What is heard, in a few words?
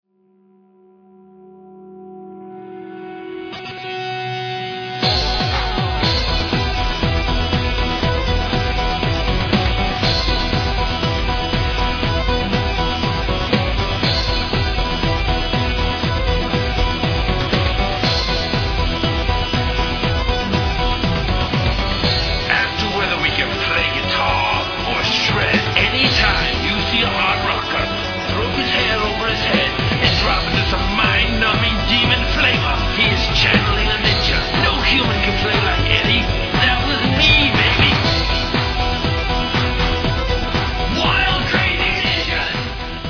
Wailing on Guitars